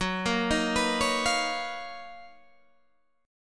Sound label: B Major Sharp 11th Gb